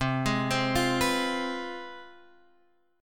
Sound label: C Major 7th Suspended 4th Sharp 5th, down